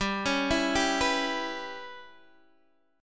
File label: G 6th Flat 5th, Guitar, DADGAD